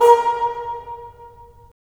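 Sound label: WND BN, wind bassoon; LA Composer vol. 3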